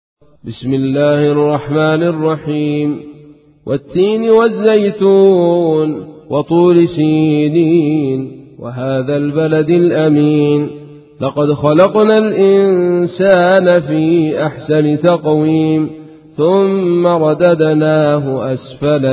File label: Surah At-Teen; Surah095